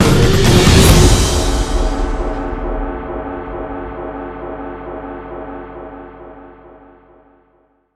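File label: sting, drums synth end; transformers war for cybertron gamerip; RESCUE ZETA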